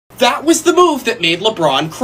cry; lebron

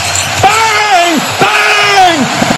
bang

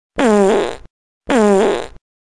farts

